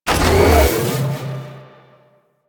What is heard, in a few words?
Back In Extraction; Warframe; Rail Jack Hunt